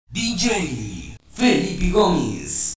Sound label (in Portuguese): vinheta